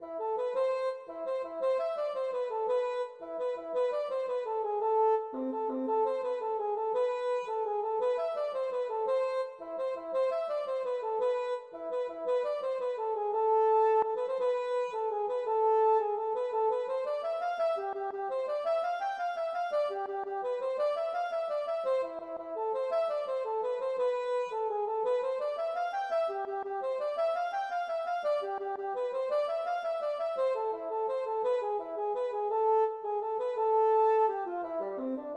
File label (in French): valse